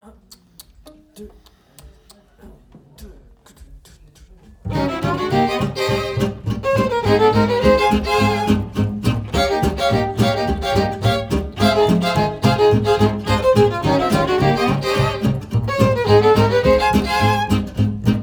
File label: Blues